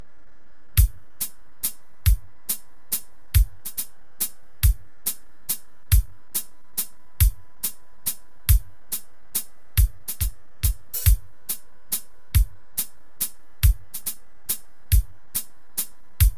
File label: Waltz 140 BPM